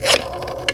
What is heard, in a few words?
PHONE DIAL; Greytsounds; HOUSEHOLD FX